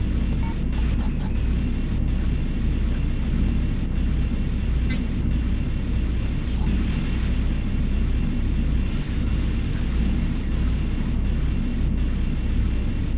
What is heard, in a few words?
computalk, ambience; gearbox